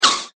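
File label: tire skid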